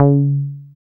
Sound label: Bass SH-101